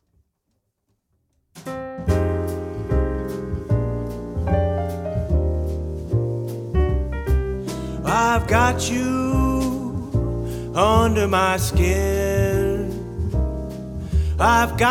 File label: Quartet Vocals Piano Bass and Drums; Reception; Upbeat sounds for dancing and revelry, elegant but fun for all ages.